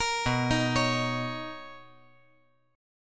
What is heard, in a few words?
B Major 9th B, chord